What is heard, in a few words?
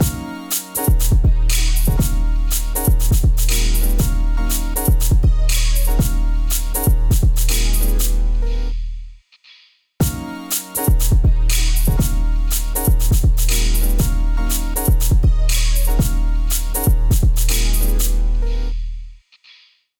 Rock Wit Me Kit, Drums; Ableton Factory Packs; Beat Tools